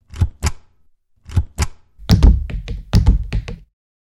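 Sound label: stamping a seal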